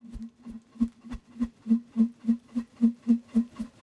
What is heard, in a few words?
ETHNO 125, FX